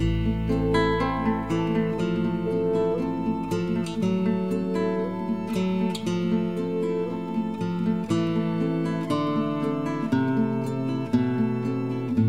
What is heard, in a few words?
guitar, Sad